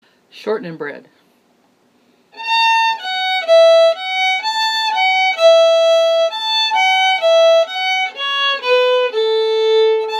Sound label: key of A; Shortenin Bread